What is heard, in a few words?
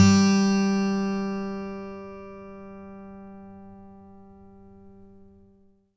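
GTR G2 12; Synclavier Sampler Library; KEYS AND GUITARS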